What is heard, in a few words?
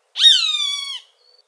hawk, buteo magnirostris; bosque seco